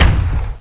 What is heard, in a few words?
FLAK; THEXDER